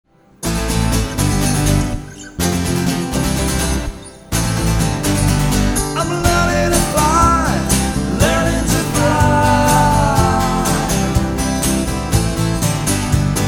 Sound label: acoustic live medley